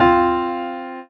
Drop Lead END; osu

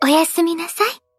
applause; osu

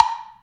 pong

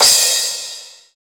drum hit finish

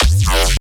bass c

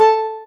soft hitwhistle; osu

